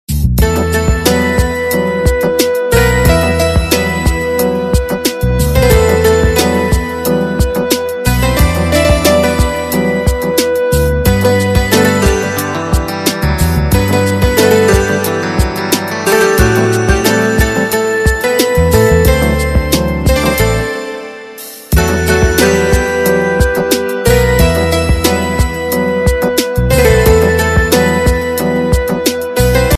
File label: Instrumental